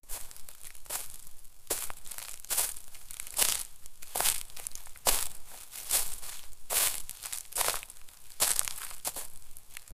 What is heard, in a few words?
heavy footsteps crunching through dry leaves in a spooky forest